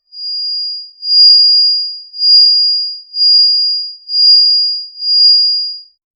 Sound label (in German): einhorn beim fliegen